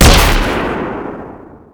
stun Grenade, Weapons; Barotrauma; Subsurface

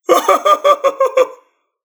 laugh; Barotraumatic; Clowns; Clowndeathmask

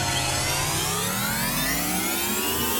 new shield charge; Barotraumatic

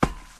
metal, Footsteps; Barotrauma

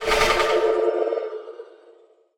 death; Barotrauma; Barotraumatic; Legacycrawler

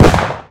heavy ammo explode; Barotrauma; barotrauma ek

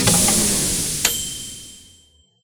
Ding; Thalassophobia Restored; Jobgear; Scientist

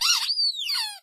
squeak; Barotrauma; Neurotrauma

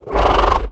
crawler idle; Barotraumatic; Cthulhu; InsanityMonsters; Hallucination1

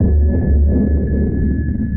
sonar Ping Suit Medium Shuttle Directional; Barotrauma; Real Sonar

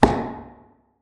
footstep; Barotraumatic; xanHuskmutanthunter

